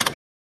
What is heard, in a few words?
Elect Wheelchair, wheelchair